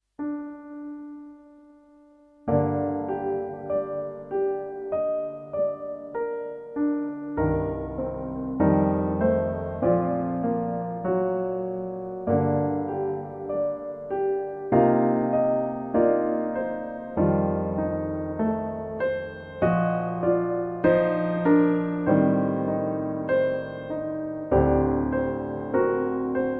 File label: Piano Version